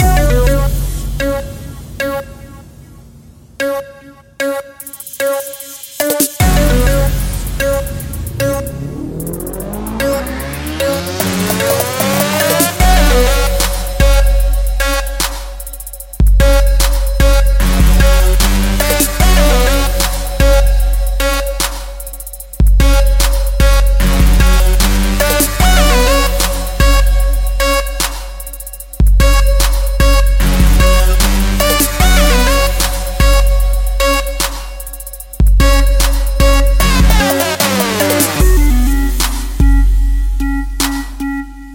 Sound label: ultimate power trap